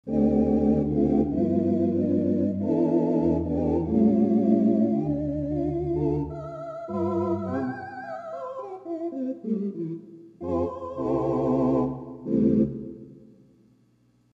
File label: 8' Tibia 4' Tibia, theatre organ; One of the most often heard Tibia registrations.